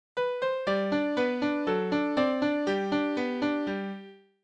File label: Alberti bass; Thomas Attwoods Sonatina in G Major opening